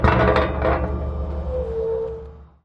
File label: clunk